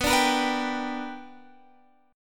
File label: B-Minor Major 7th Sharp 5th B down Guitar Standard; strummed; JGuitar